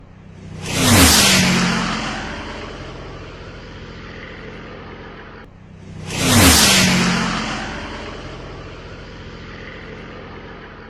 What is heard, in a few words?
Military Chopper Ringtone